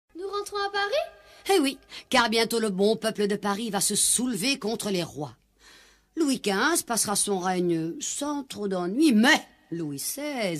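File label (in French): les chants révolutionnaires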